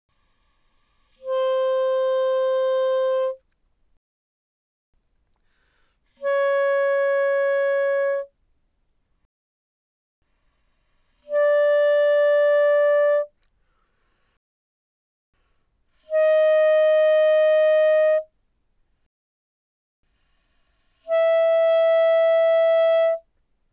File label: Bb Clar mf C5 B5, Bb clarinet, woodwinds